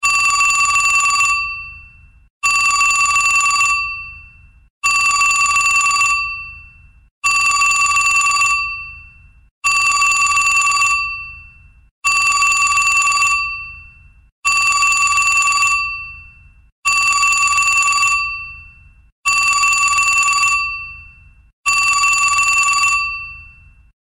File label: Vintage Telephone, ringtones; Lucid 4G VS840; LG